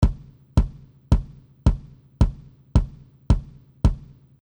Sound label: Kick Sample Dry